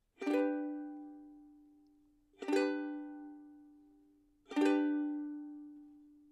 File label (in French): Ukulele G Accord